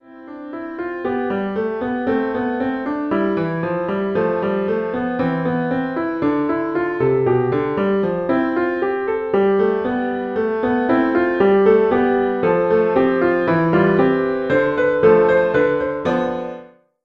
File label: elementary piano solo